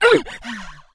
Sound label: harpy Dmg; Seer; GamigoNA